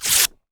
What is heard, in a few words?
PAPER, TEARNG PAPER; AKAI S-Series CD-ROM Sound Library VOL-6